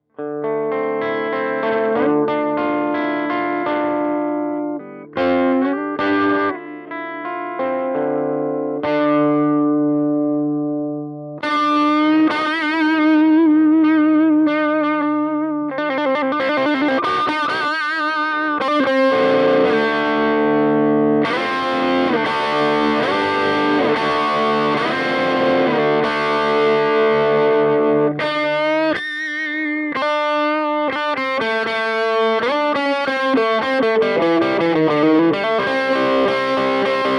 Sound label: Compadre dirty Boost treble bridge